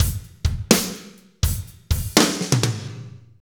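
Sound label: slow shuffle, Roland drum beats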